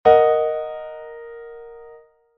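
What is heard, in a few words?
D♯ minor chord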